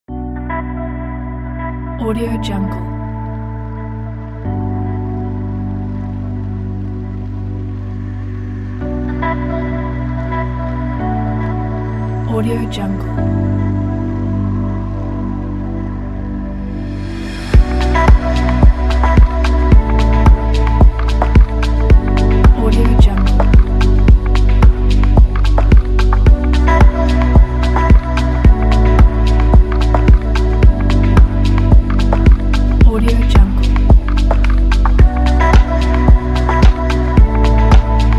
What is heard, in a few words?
dreamy chill house